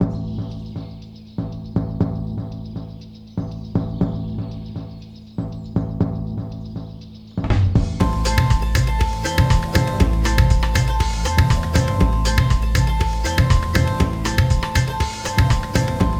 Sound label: world music loops